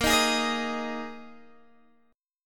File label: Bb 7th Suspended 4th Bb Guitar Open G